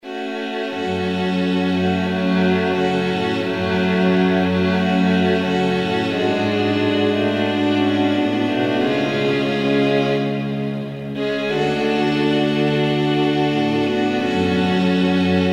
viola

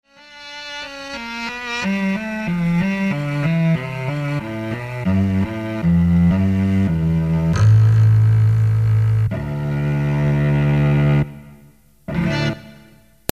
cello

